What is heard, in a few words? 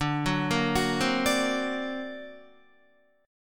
D Augmented 7th D down Guitar; D+7 arpeggiated; JGuitar